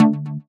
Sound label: Dm, Chords; Slinky; M8; Electronisounds